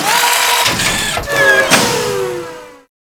Shipyards Robot Arm Large Deactivate; Ambience; Props; Warframe; Grineer Shipyards

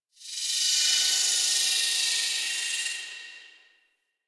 Sword Pulse; Cinematics; Warframe; Orbiter Cradle

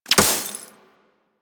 Laser Impact Glass Large

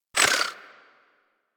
Pistol Proj By; Warframe; Tenno; Guandao

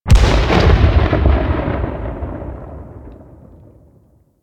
Weapon Enemy Grenade Tail Outdoor; Warframe; Weapons

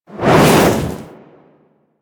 Look Whoosh; Ballas; Warframe; Quests; Chimera Prologue

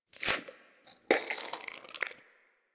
Wheeze Close; Ambience; DE; Warframe; Infested Eris

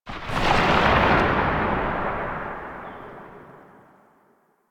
Gunbrella Alt Tail, Weapons; Warframe; Tenno; Koumei

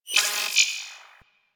Tech One Off, Ambience; Warframe; Rail Jack

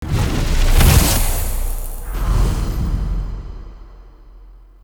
Invis Rune Cast; Powers; Runes

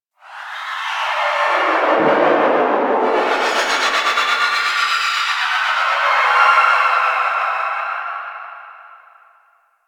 Undercroft Shriek Tonal Verb One Off, Ambience; Warframe; Duviri

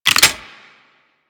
Clip Out, Rifles; Snipetron

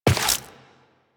Step Rock, Footsteps, Walk; Warframe; Tenno

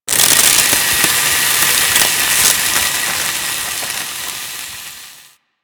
Large Fire Extinguish; Warframe

